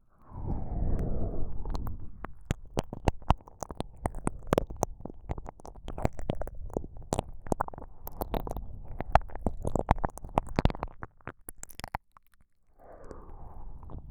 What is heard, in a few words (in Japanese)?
KU100 左耳 奥を自由に舐められる30分